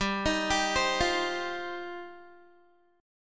G 7th Suspended 4th Sharp 5th, Guitar; Listen to G7sus4#5 arpeggiated; G7sus4#5 Chord

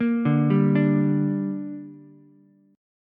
D Suspended 4th Sharp 5th, Ukulele; 3,2,3,5; arpeggiated

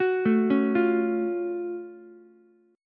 F sharp Minor Major 7th F sharp down Ukulele; arpeggiated

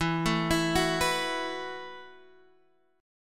E Suspended 2nd Suspended 4th, Guitar, Open G; x,9,7,9,7,9; down

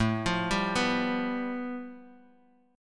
A 7th Suspended 2nd Sharp 5th, Bass Standard; A7sus2#5 arpeggiated; JGuitar

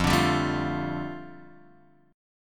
F 6th Add 9th, Guitar Open F; strummed; chord; x,0,2,2,0,0; JGuitar

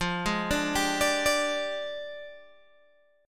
F Minor 6th Add 9th, Guitar, Open G

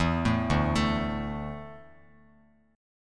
D Suspended 2nd D down Bass Standard; arpeggiated

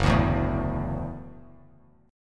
F sharp Minor 7th Sharp 5th F sharp; strummed; JGuitar

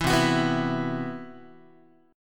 C Major 7th Flat 5th down Guitar, chord; CM7b5 strummed; JGuitar

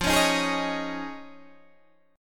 F Minor 7th Sharp 5th, Guitar, DADGAD

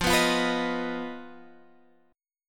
Eb Suspended 2nd Sharp 5th

